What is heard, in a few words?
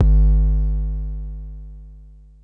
kick